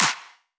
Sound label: normal hit clap; osu